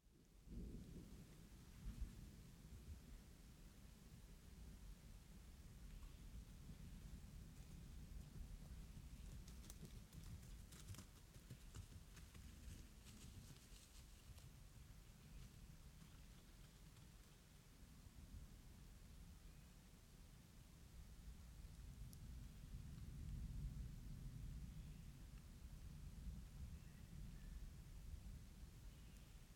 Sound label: Thunderstorm and Dawn Chorus, Dawn chorus with thunderstorm high in the mountain valley; Ivo Vicic; Wind Is the Original Radio